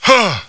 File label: ungh